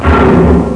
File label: bang; PSL Monthly Shareware CD-ROM (Public Software Library) (January 1994)